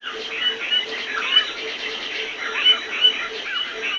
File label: birds